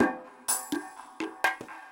PERCUSSN ETHNO 125, Drum

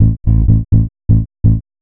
HOUSE BASS 125 A

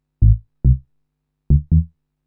SNTH BASS TEKNO 140 A

